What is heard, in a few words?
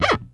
SCRATCH, EFX SCRATCHS